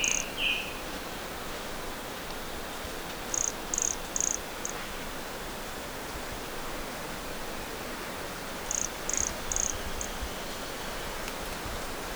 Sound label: Brachycephalus sulfuratus; Morro do Garrafo; LFRibeiro